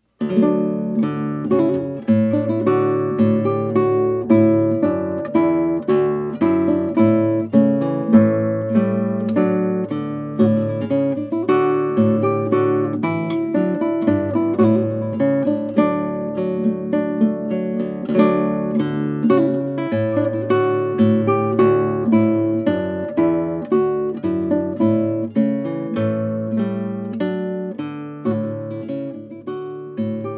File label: Trumpet Voluntary, guitarist; Purcell